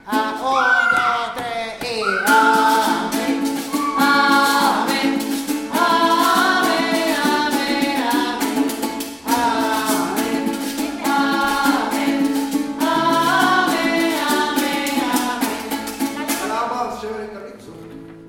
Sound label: amen